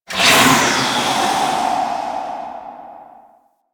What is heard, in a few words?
Stream Blast B; Warframe; Ambience; Grineer; Grn Hanger